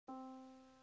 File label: yamaha Disk